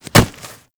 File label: Generic Mantle Foley; Warframe; Characters